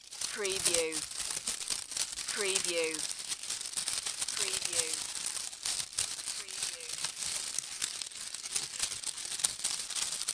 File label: Leaves Rustling